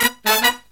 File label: Killer Brass Riffs, SOUL